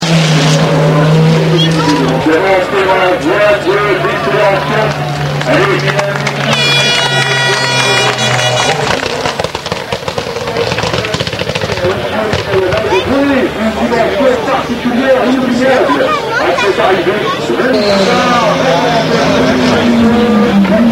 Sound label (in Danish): toyota med klap